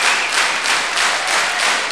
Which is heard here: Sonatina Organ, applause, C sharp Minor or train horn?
applause